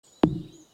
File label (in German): axt; stardew valley